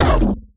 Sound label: explosion dark; Epic Toon FX